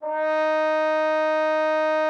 D#4, trombone; Xsample VOL-3

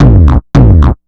4 floor beat, trance

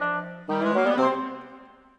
Winds, Orchestral; Sonic Foundry (Sony Creative Software); Orchestral VOL-2; Modern 19

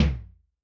Hybrid F, Tom, Yamaha DTXpress-II

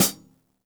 hihat - closed side; JazzFunkKit

